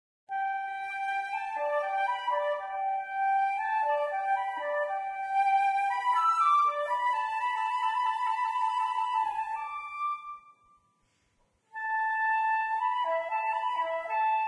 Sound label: Flute, William Tell Overture; Instruments; Zomboid; Lifestyle